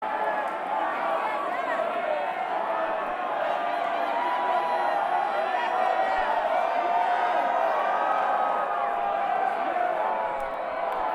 Banter Crowd

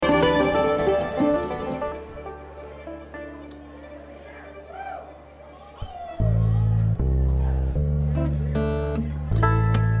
Traffic Jam

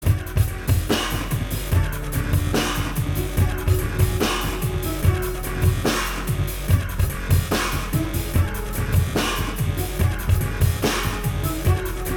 Improv Jam

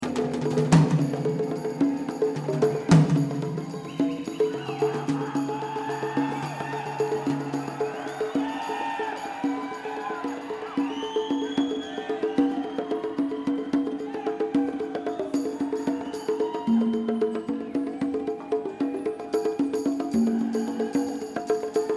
Drums

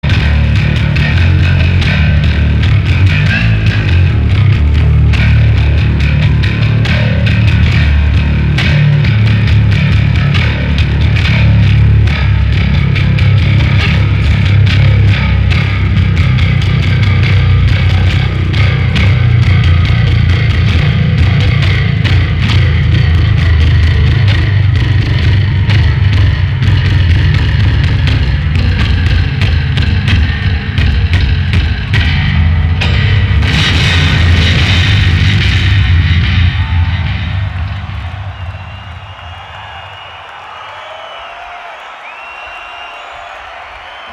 Bass Solo

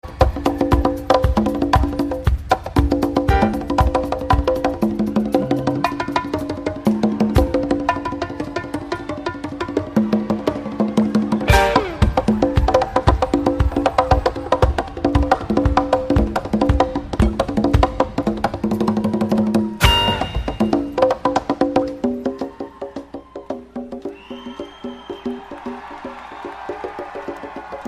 Percussion Jam

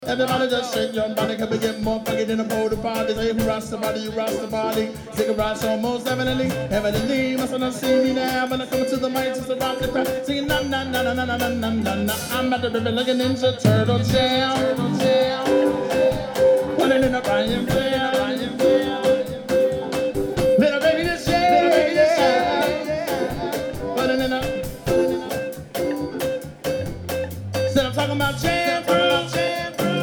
Hip Hop Jam

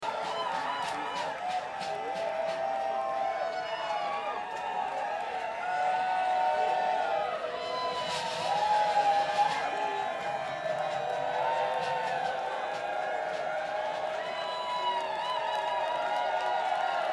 Crowd

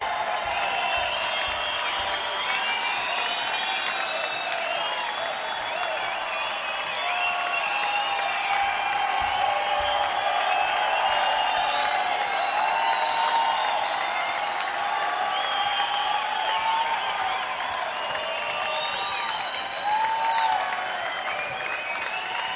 Crowd Noise

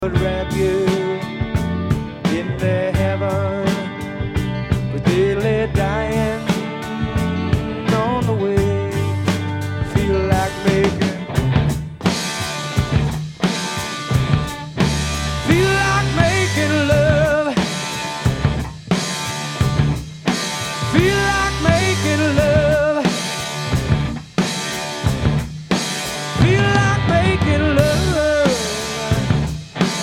Harmonica Version

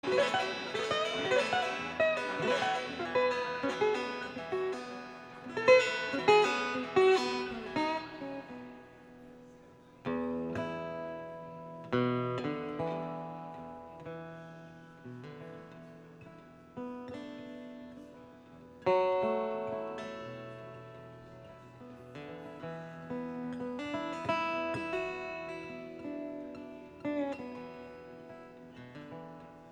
Solo Raga

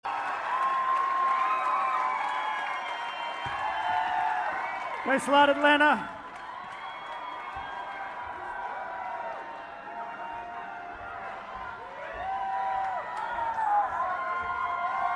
Crowd Banter

